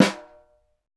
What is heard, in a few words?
Snare, Roland LA Composer